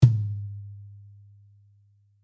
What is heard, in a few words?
Tom, DrumKit, REAL LIVE KITS; Osama Qureshi DrumKit